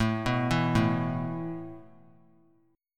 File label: A Suspended 2nd A; Asus2 arpeggiated